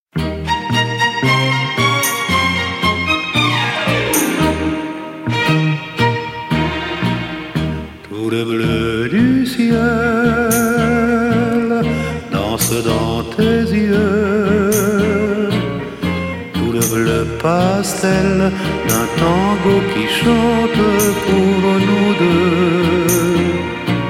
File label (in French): Tango